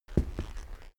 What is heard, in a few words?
step wood step